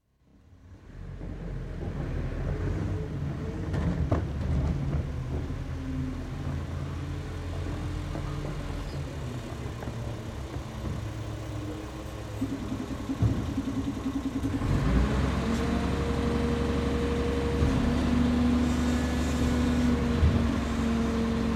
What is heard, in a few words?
Refuse lorry approaches, bins emptied house to house, departs; City Sound Effects; Council Activities; BBC